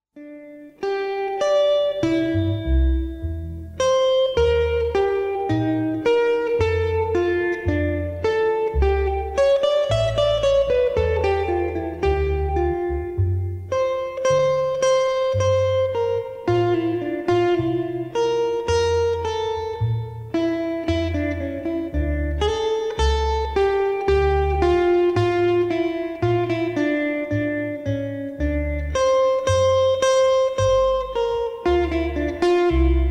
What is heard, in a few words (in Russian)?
solo na gitare